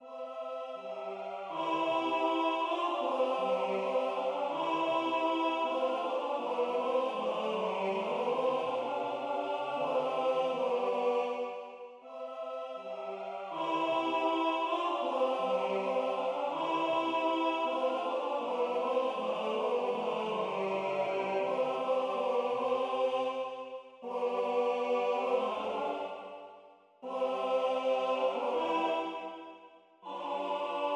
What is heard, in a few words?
Agnus Dei Tenor